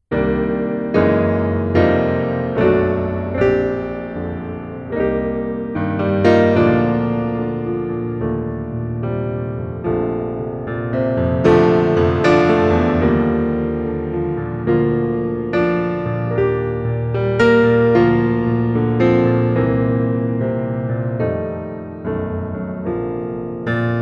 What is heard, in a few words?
accompaniment only, key 2